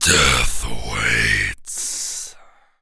carrier go, zombie

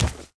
ghoul foot; arkane; monsters; footsteps

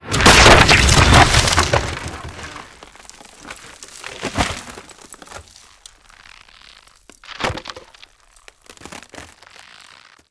bridge falling; arkane

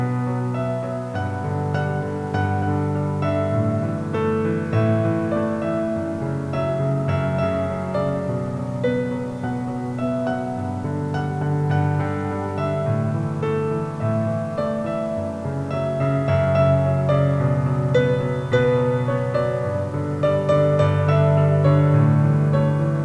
pianino

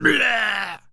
zombie male pain

